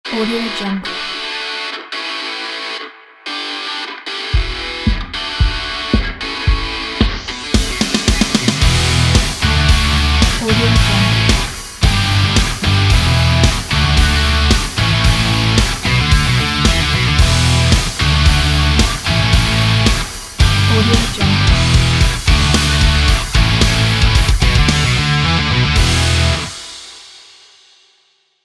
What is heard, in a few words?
hard rock logo